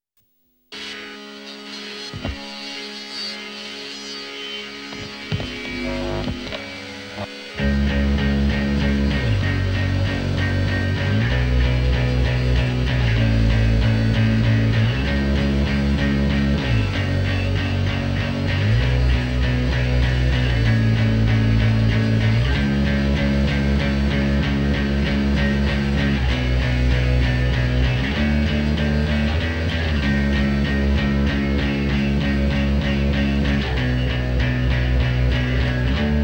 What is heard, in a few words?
Lullaby